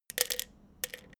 pills drop into box

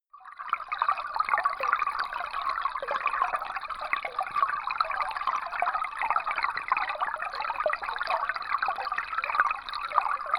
stream

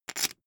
poker chip stack rattle

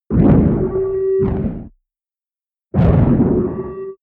whoosh distorted swish humming

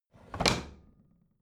bathroom drawer close heavy

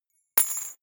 key ring small drop parquet soft

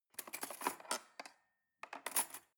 cutlery cake fork drawer scrape

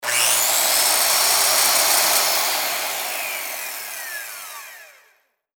electric hand saw

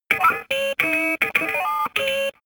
digital printer

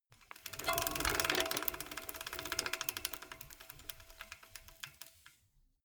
bmx bike freewheel reverse

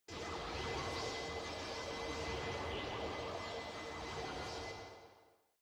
space alien fabric sawing machine high

